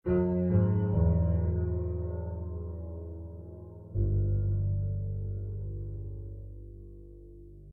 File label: Stinger Enter Default Maze